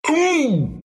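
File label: pain, Russian; dota2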